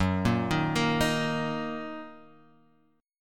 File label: D sharp Minor F sharp